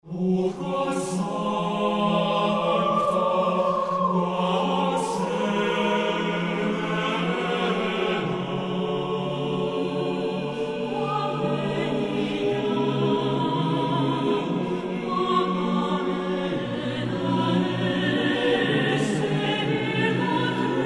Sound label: Lilium acapela